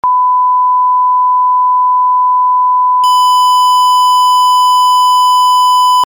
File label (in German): Eintonanregung